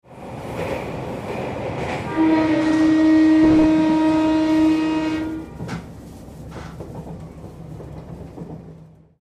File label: klaxon